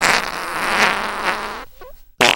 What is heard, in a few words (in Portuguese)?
Som de Pum Molhado